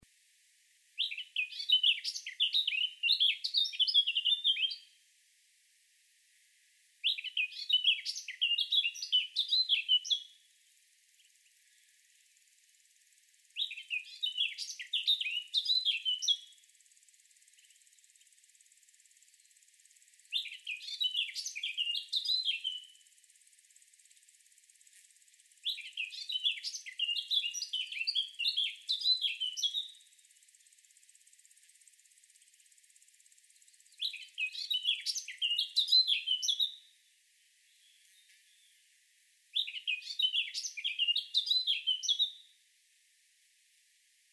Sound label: Sylvia atricapilla; SETE